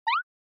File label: Anime Motion Fast